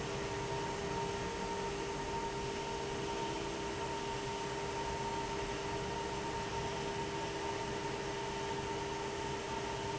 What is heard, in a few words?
fan